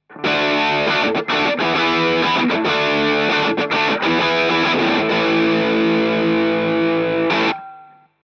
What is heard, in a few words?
HPF Full Guitar Amp Crunch; Midas 502 V2; Extinct Audio Black Ops